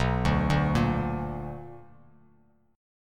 B Minor Major 7th, down, Bass Standard; arpeggiated; JGuitar

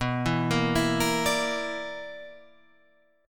B-Minor Major 7th Double Flat 5th B down

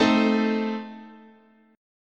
A Diminished, chord, guitar

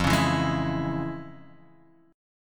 F Minor Major 7th Sharp 5th, chord

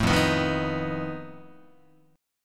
F 7th Flat 5th F down Guitar; F7b5 strummed

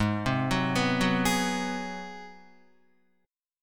Ab 7th Sharp 9th Guitar, chord; arpeggiated; JGuitar

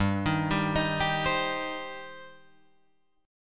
G Suspended 4th Sharp 5th G, down, Guitar, Drop D

